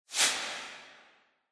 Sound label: Noise Sweep Reverb